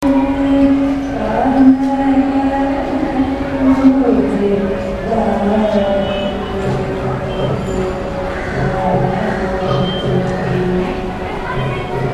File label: eswara temple, india; Ted P. Eugenis